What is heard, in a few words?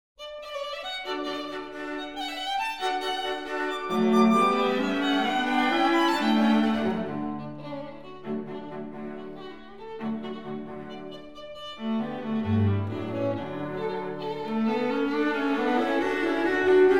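string trio; dittersdorf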